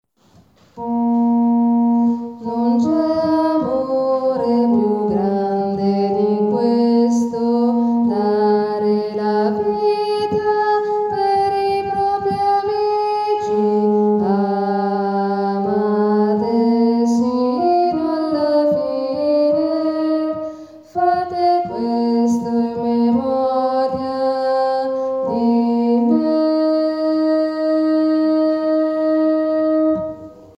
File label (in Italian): bassi